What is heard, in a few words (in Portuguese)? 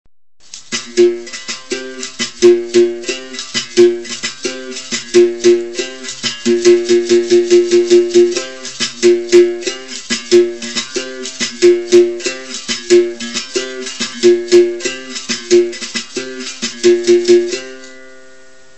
Toque de regional sao bento grande